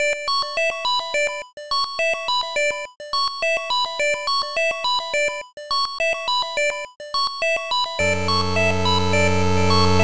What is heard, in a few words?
8bit Crystal Ice Cave